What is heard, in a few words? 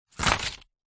foley money coins tip into paper envelope